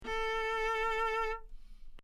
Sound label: viola; orchestration